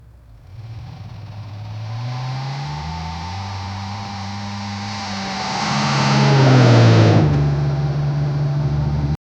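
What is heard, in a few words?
heavy truck gear downshift; collision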